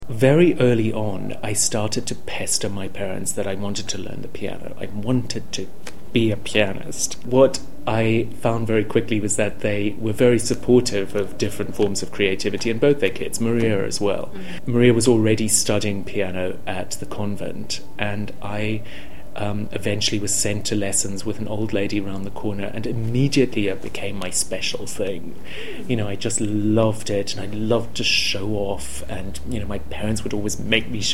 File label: piano